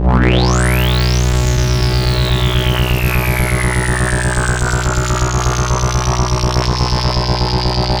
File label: Roland C B1, Roland S100; musicradar-synth-samples